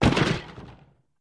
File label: Objects Fall Various